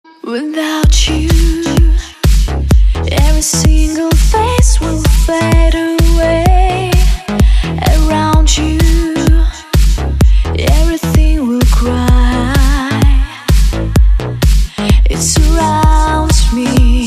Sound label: vocal mix